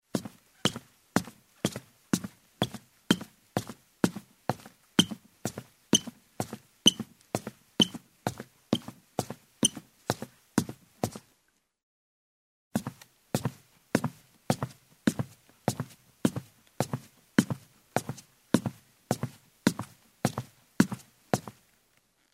Steps on a wooden ladder in shoes

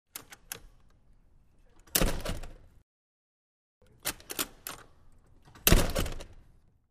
Metal door open, close

